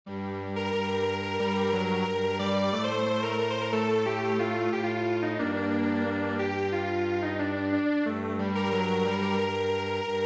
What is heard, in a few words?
Strings Quartet, traditional